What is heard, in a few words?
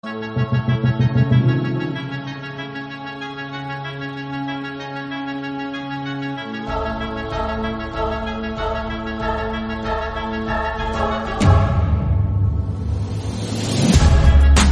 violin orchestra